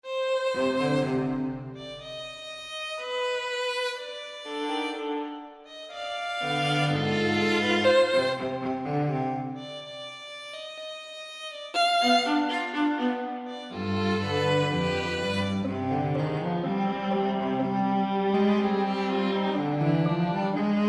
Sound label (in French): Etude pour quatuor à cordes